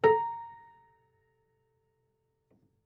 Prepared Piano

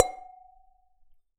Tap Glass, Medium Wine Glass

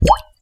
Bubble; Bubbles

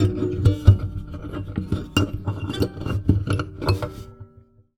Classical Guitar Extended Technique; Classical Guitar Extended Technique Mono

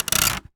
Polystyrene Creak

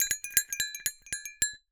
Cowbell Chain, Percussion

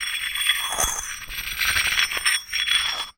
Hole Saw Scrape; Hole Saw Bits

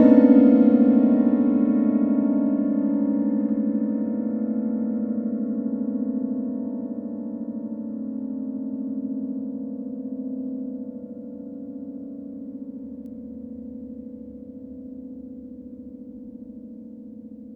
String Brush No Att, Piano; Piano Miscellaneous

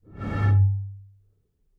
Bottle Blown

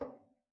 Ping, Kitchen Toaster

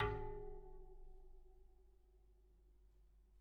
Bicycle Spoke

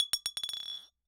Bottle Struck

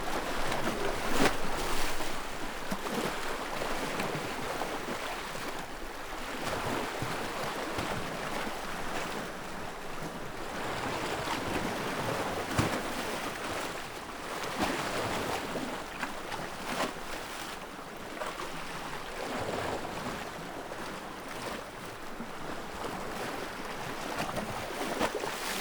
waves

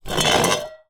Drag, Vase

